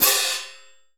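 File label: CHOKED, CONCERT CYMBAL; Northstar - New Gold VOL-2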